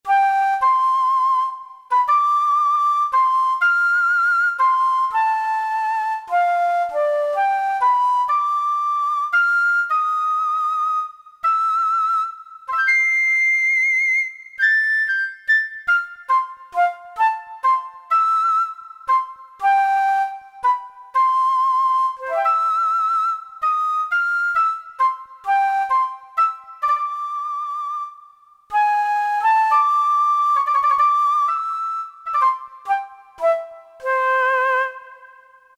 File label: FLUTE, Korg DSS-1; Woodwinds